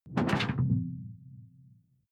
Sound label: sci-fi alien bloom; swooshes-swishes